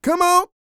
SING, Vocal Planet R&B & Dance; ILIO

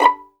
STR 2 PIZZ B5, String Sections, Violins Pizz; Roland LCDP13

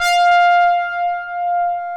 OBERHEIMS

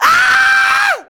VOX, Singin; Sound & Vision; Gigapack II CD 2 (Roland)

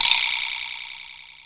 VIBRASLAP; Back In Time Records; Big Bang; THE ROCK

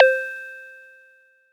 SY SQUEAK; ModelCycles

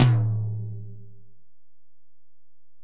Tom, Alesis DM-PRO, Drum Machines, Toms

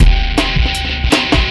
Beat, Futurist Drum & Bass; Drums & Percussion

